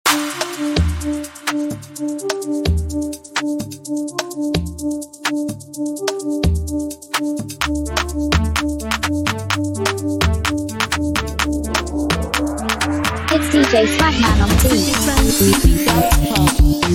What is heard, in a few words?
Beat